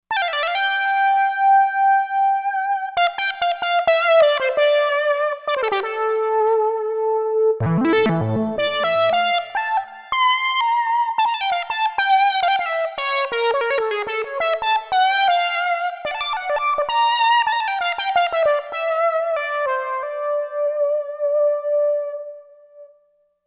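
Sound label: Lead Uni Pulser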